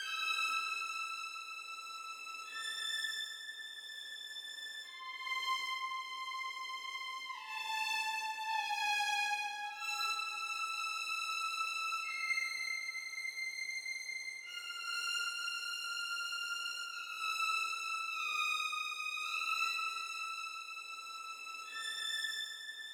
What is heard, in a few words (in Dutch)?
Violen; Toki Tori 2